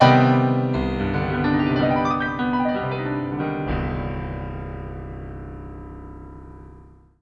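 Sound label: Piano, large, reverb time 1.0 seconds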